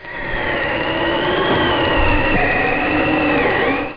Fast Wind Sound

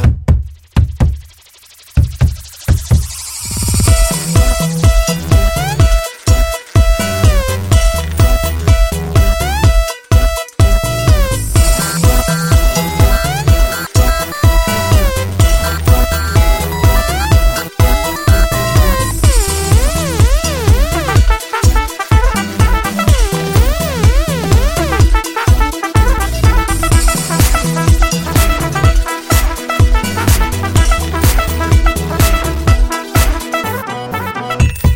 BGM Telugu Ringtone

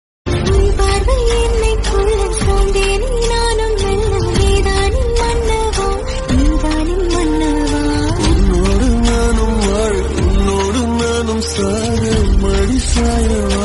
Tamil Song Ringtone

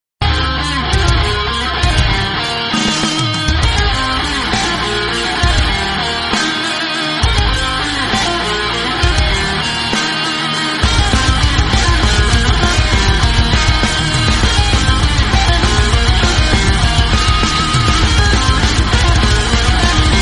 Metal Rock; Nokia

